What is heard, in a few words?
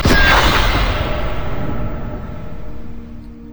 tatsumaki; LoL; yasuo base